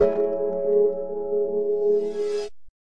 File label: lightgrid chord plucky, Synth